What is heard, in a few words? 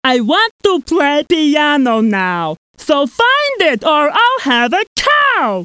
pian; Lost & Found - Volume 1; OmniMedia; puzzles; keys